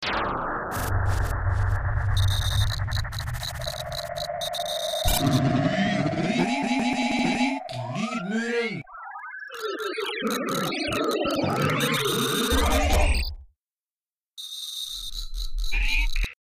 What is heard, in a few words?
Jingle remiks